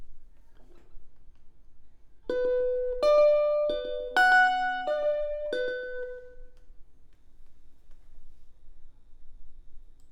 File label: Elect Guitar